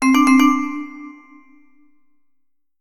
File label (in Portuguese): notificacao